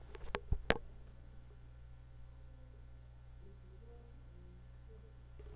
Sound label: crowd